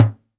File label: Rack 30cm, Drums; Polyend; Simulacrum Sounds; 1 Shots; Acoustic and Electric